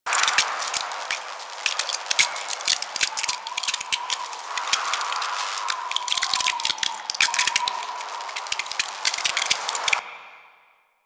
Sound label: Vial Ice Loop; Warframe; DE; Warframes; Alchemist; Elements; Ability